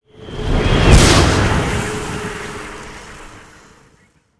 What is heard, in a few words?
spell magician ice floor